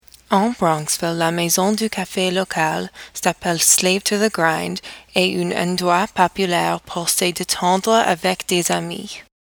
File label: My Song